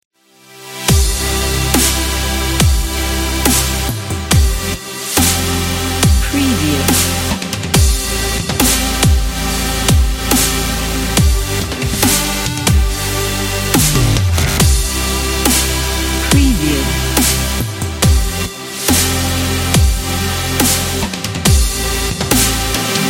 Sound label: dubstep motivational